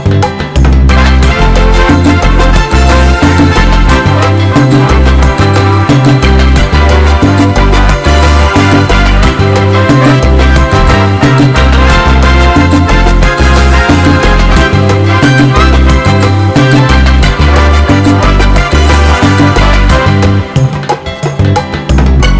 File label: Slow and Great Sounds